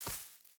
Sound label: footstep hide grass run; Rust